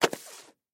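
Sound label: slide change sound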